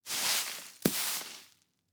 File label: Household Broom Sweep Leaves On Cement Fast